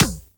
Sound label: Beach Ball Kick; Warframe; Water Fight; Beach Ball Floof